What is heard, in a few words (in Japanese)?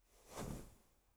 布ズレ; 効果音